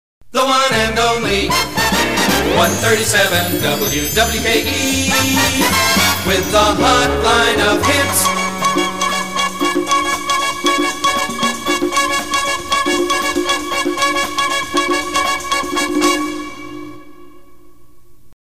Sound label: jingle with the Hotline of Hits; WWKE